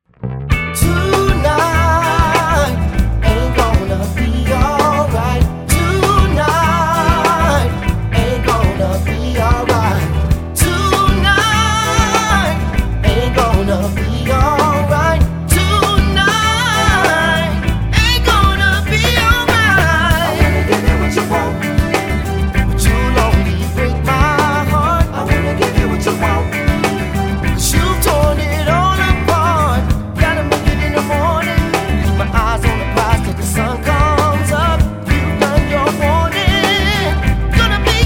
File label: RETRO RB